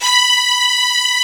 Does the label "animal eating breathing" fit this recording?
No